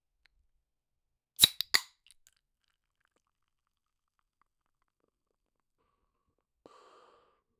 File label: open cap and drink beer, alcohol